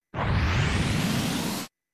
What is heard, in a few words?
boost